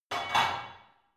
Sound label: barbell only replace unsteady reverby, fitnessstudio; sport freizeit